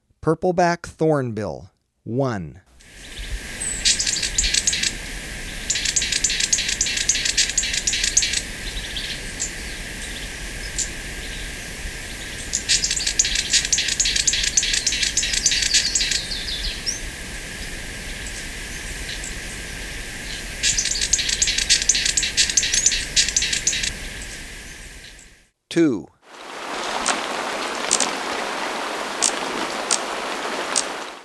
Purple-Backed Thornbill, Birds of Ecuador; S. America

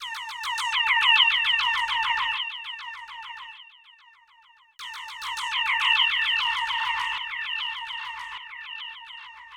Vapour Alarm, Electronica Tonal

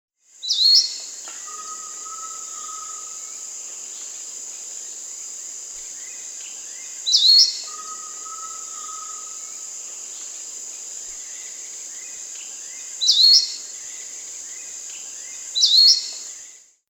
Forest Elaenia (Myiopagis gaimardii), Peru; S. America

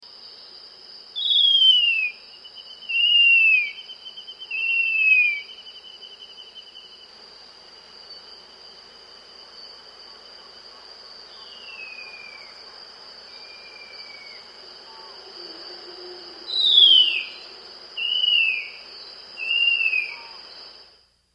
Spotted Woodcreeper; S. America

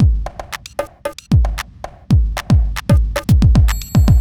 r and b

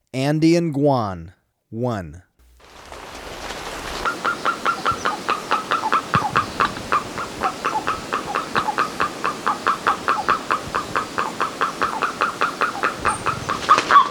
Andean Guan, Birds of Ecuador; S. America

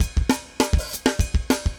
brute, metal

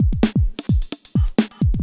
electro kit 130

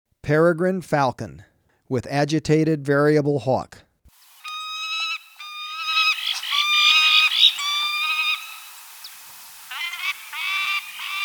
Peregrine Falcon; S. America

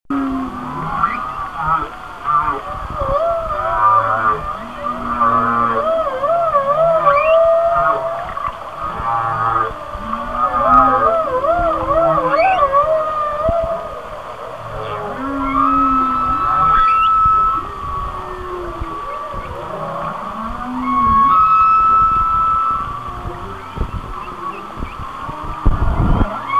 Whale songs; Mike McDonald

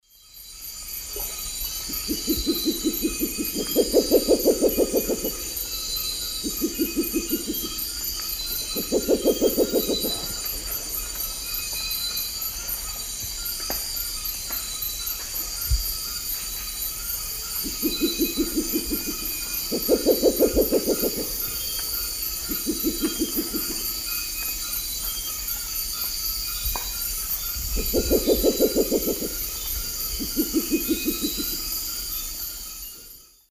Pulsatrix perspicillata, duet; S. America; Eastern Lowlands

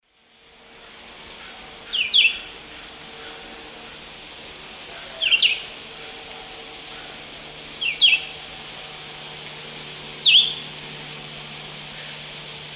Vireo olivaceus, song; S. America; Eastern Lowlands

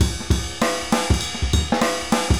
funky 100

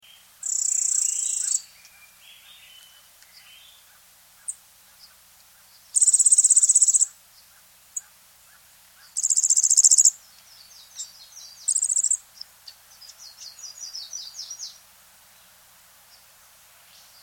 Yellow-Faced Grassquit; S. America

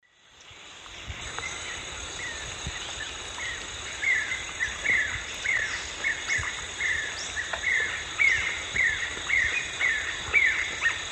Monasa flavirostris, song; S. America; Eastern Lowlands

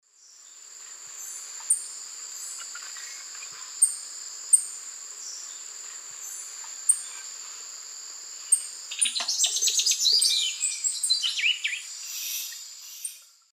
Molothrus bonariensis, calls and song; S. America; Eastern Lowlands

